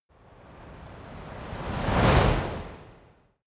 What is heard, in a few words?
fire torch bright slow flutter whoosh; swooshes-swishes